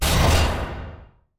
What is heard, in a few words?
turret reload; weapons; Quake 4 Alpha; id software